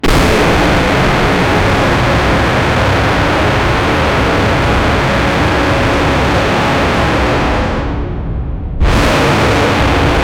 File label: the very air tightens pre, subsonic